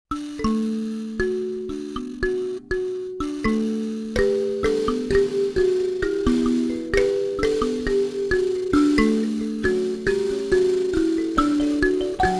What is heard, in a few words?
mbira